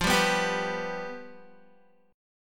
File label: F Suspended 2nd Flat 5th, Guitar; strummed; 12,10,11,12,11,x; JGuitar